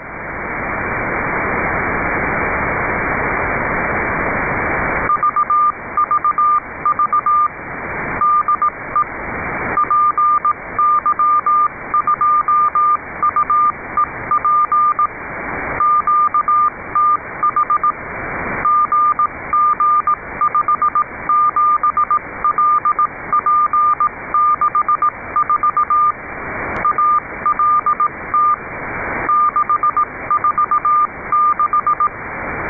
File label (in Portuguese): CW teste; Icaro5